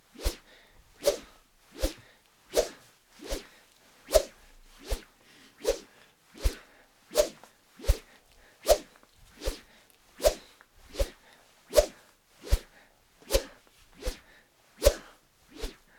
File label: Fighting Whoosh